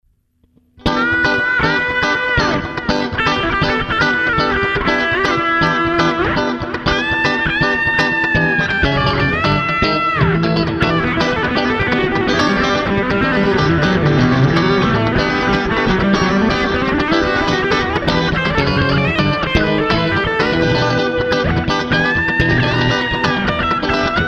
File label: funk rock